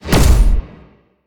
Smashfist Control; We Are the Dwarves

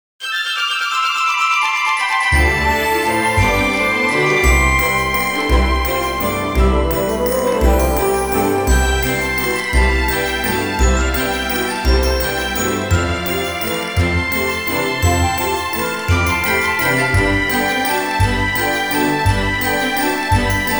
British-style Waltz

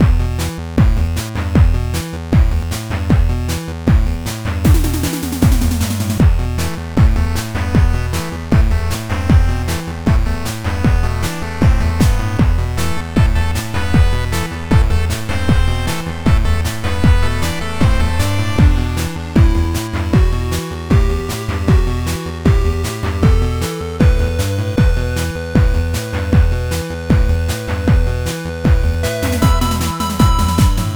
Bonus; heroes of hammerwatch

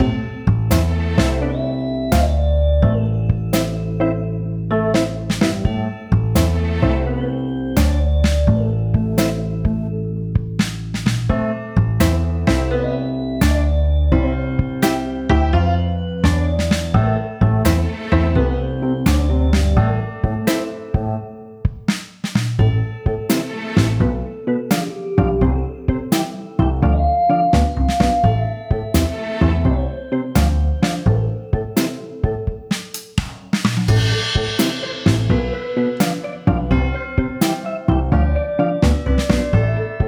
Haunted Loop; Charlie's Adventure

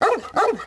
bark; lego my style preschool

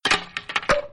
cane sound; Arc of Templar

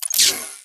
Weapons Sniper

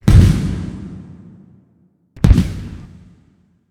gunpowder different places explosions; a dump in the dark

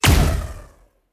Weapon Shoot

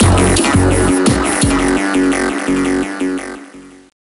Industrial Crash

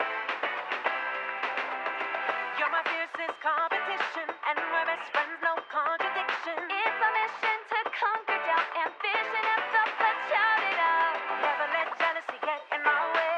Camping Radio Music; Barbie Dreamhouse Adventures

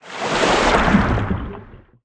Soda Wave In; Candy Crush Soda

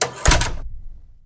Doors Door Wood Close; Counter-Strike: Global Offensive

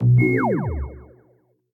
Droid Scanner

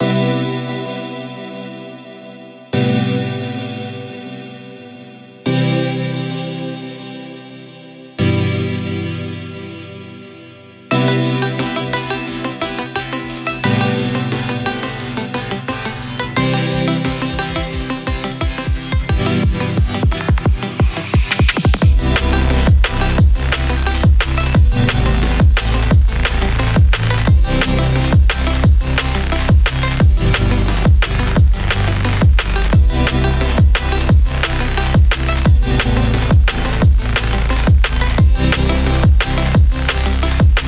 Jingle (DNB Remix); doors original game soundtrack vol. 3 2024

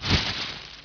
Rustli; Buggy; PS1

